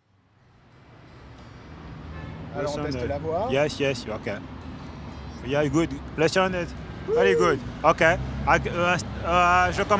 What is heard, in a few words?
Street Music; Paris